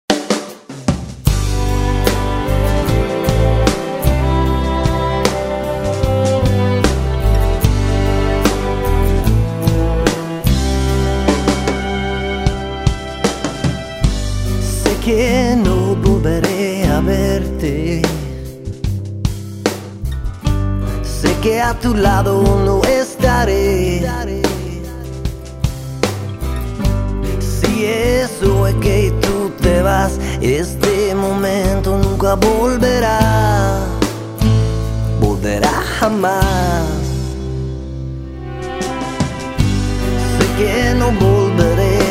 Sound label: spanish; Rock Band